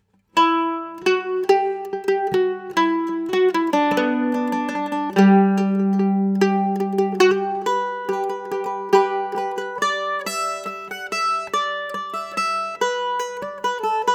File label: walts, mandolin